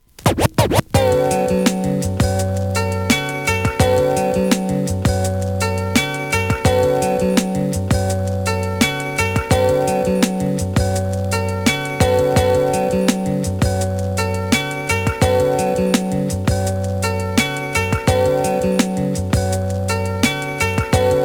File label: interlude instrumental